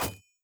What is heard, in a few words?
breakable pole death; Hollow Knight